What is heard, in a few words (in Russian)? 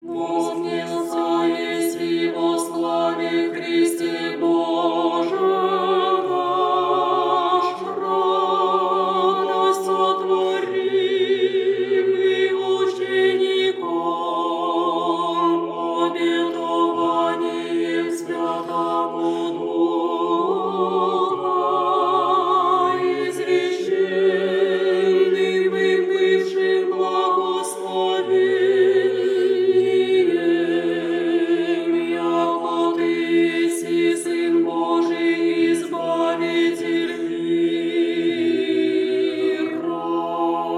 voznesenie gospodne tropar glas 4